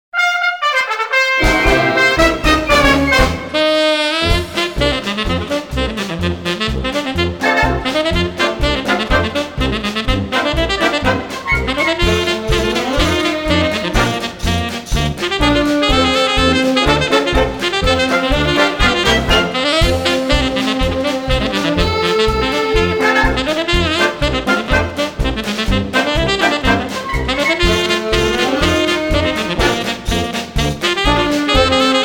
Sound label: Sax